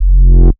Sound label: bass tweak slip F; Polyend; ARC Noise